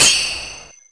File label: freezing trap; hunter